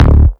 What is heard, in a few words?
EDM; Warframe; Bard; Bard Instrument Packs